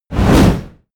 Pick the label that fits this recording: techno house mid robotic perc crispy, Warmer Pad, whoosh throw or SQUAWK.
whoosh throw